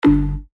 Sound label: Bass Shot G, synth shots tech house; Funkshion; revealed